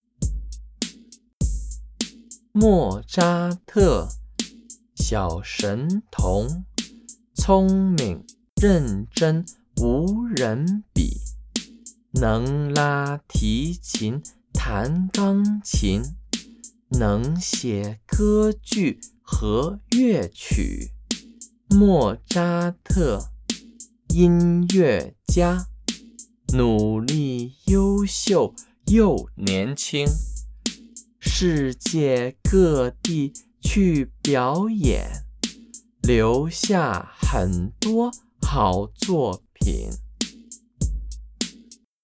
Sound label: Chant